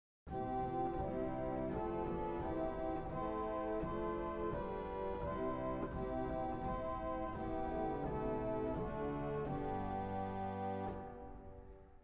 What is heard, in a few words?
Viole Celeste; CavColMainz